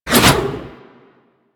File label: Reaper Scythe Spin Whoosh